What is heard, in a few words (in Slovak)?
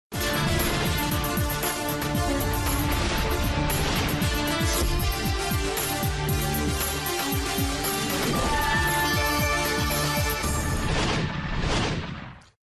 znelka; riskuj